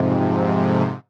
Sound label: Fm Dub Chord